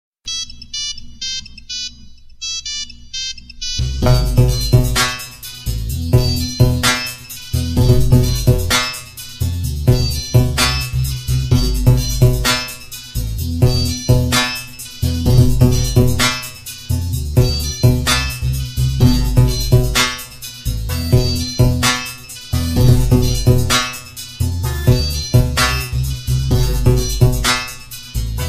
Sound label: nokia robotic